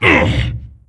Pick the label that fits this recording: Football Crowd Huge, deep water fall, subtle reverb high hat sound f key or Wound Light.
Wound Light